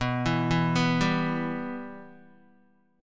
B Suspended 4th Sharp 5th B down Guitar, Bsus4#5 chord; arpeggiated; JGuitar